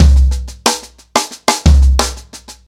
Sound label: 121 bpm drum loop sample c key, kick tuned to the C note